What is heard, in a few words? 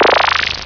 Rebirth; Super Maze